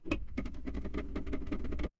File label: mechanical engine